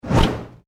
Science Fiction Vanish Teleport Appear Super Power; Tech